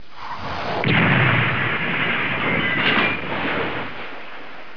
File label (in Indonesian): suara mobil